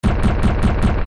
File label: Fire Temple Platform Sink